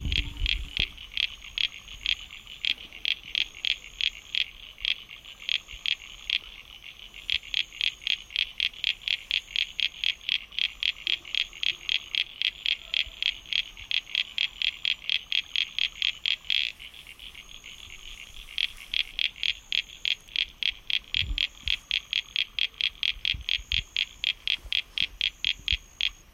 Australie Blue Mountains grenouilles

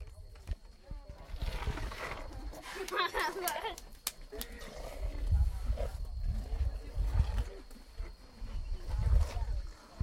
les éléphants mangent des bananes, Laos

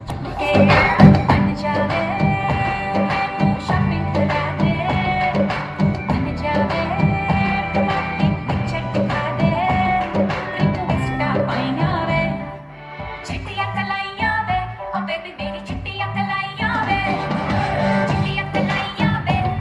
Inde musique de roy

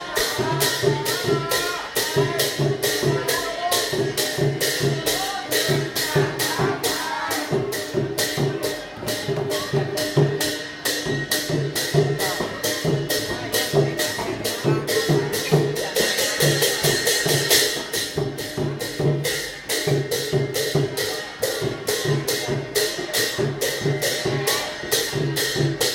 Bangkok danse thaie; Thaïlande

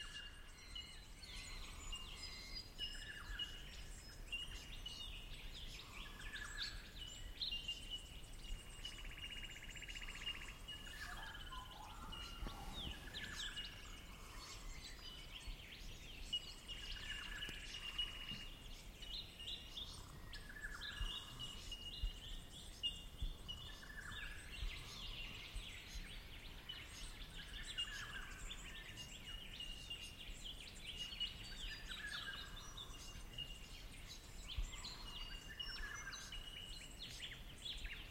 oiseaux sous la tente, Australie Blue Mountains

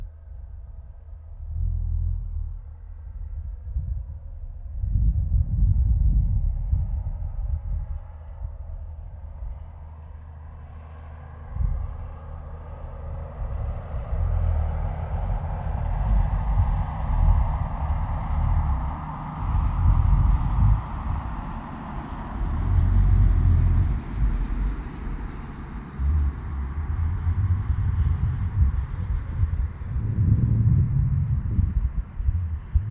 Australie outback road train

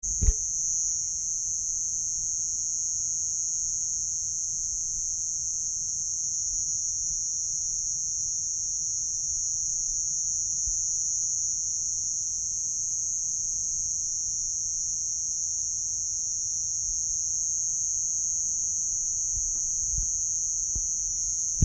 cigales du Brésil; Iguacu

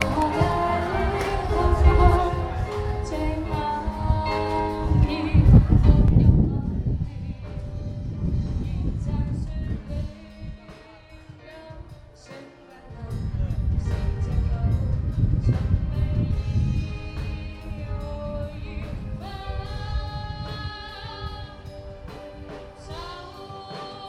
Chine concert pop dans la rue

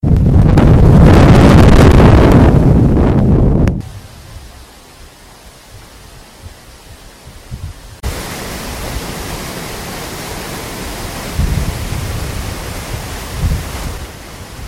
les chutes d Iguaçu; Bresil

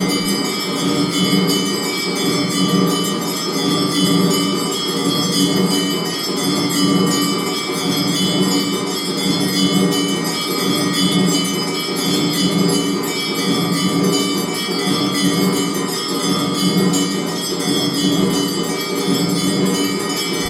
Inde Vanarasi cloches dans un temple

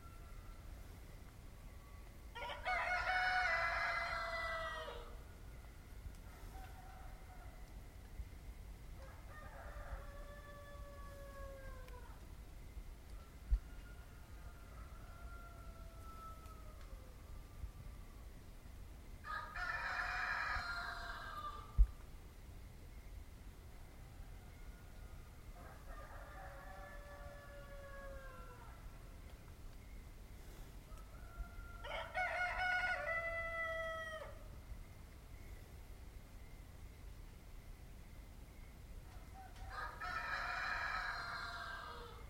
Bresil Paraty Concert de coqs

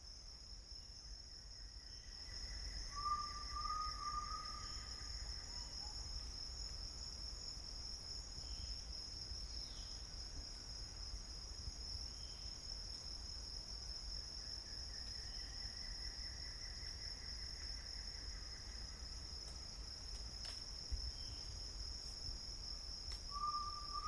Amboro dans la foret; Bolivie

